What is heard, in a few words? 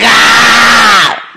gaaa